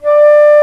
alto flute mf d3; Digital Sound Factory Virtuoso

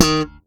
ALEMBIC BASS POPS D#3; Masterbits CLIMAX 7 - Bass

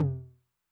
HI TOM, Med Tune Long Decay, 909; classic machines; HT - HI TOM

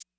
Maraca, 808

